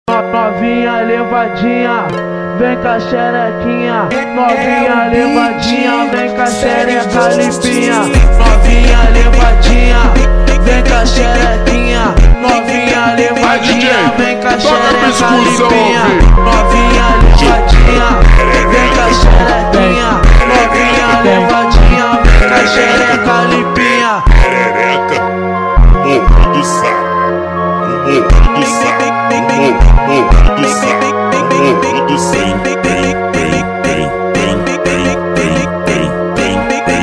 super Slowed; funk

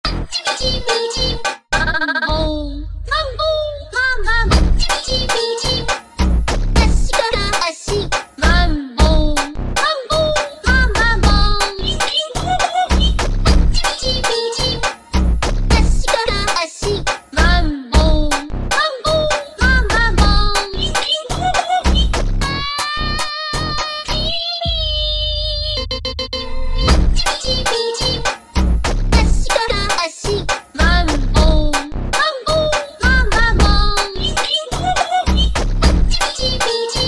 Funk ultra Slowed

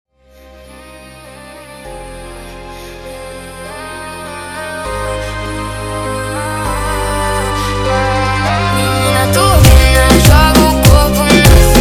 Funk

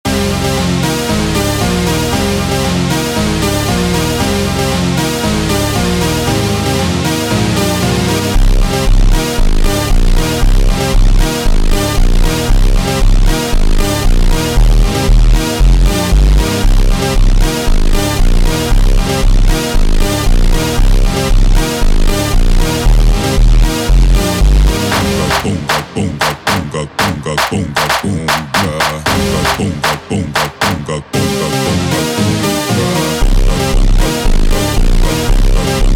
Automotivo slowed, funk

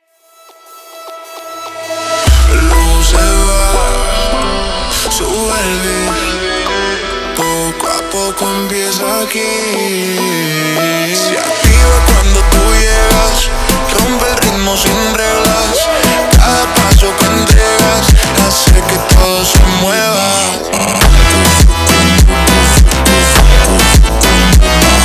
slowed, funk

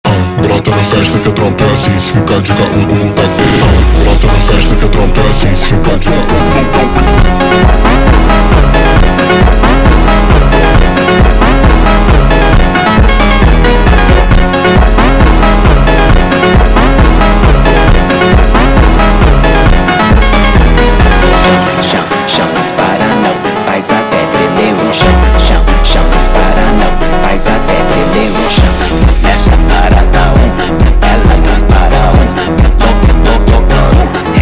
Funk sped Up, funk

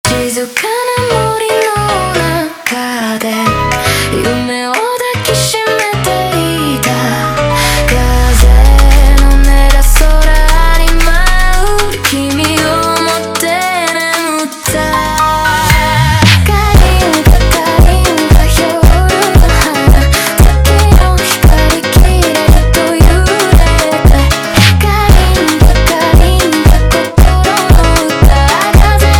Funk

